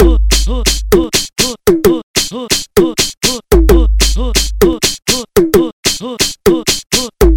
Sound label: beat